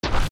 Rock Pickup; zelda; OOT

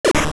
Gust Jar Blast; The Gust Jar blasting air

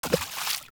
Item In Water; Zelda; OOT

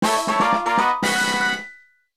Fanfare Treasure Chest; Reel in a treasure chest

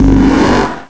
Armos Power; Armos powering up

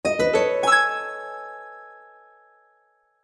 Secret Minor; ZSS

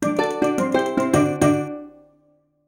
Fanfare Catch Fish

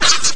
Jump; Peahat; Zelda

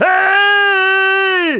Goat Loose; Fado: "Heeey!" (shouting about a goat running loose)

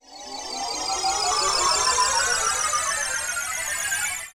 warp song out; OOT

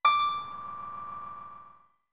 Harp D2 long; OOT

